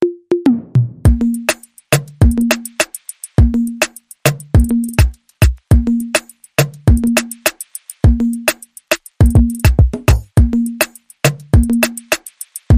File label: Convertible Music Beat